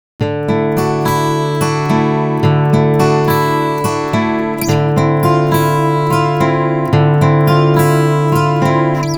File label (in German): Gitarren separat, jedoch mit einem Simultanton eingespielt